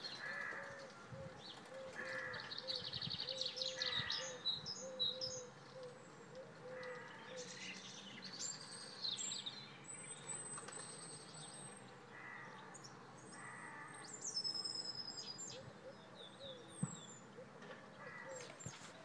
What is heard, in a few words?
squawky birdsong live from Cambridge